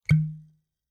Bar Whiskey Small Liquor Bottle Opening Cork Popping